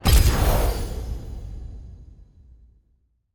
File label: magic button click